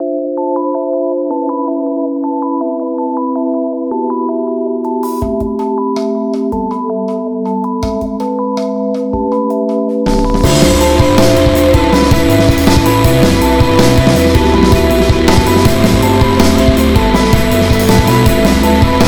irregular phrase

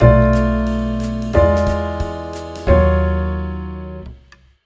sub II m minor 2 5 sub 1

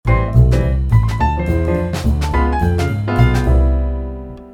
C4 tritone sub

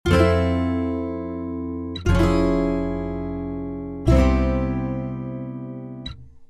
tension IVb13

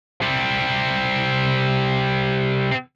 power chord clear

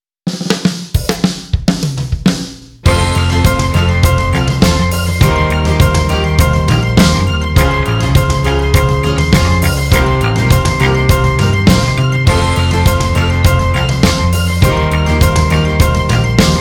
Sop Pedal

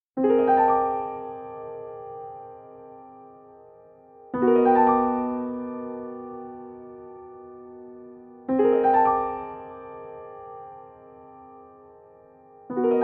polychord

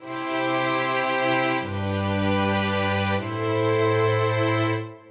classic deceptive cadence

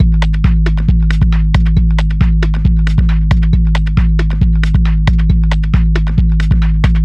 beat jersey

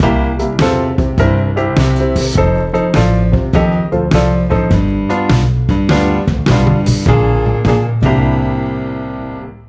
sub V blues

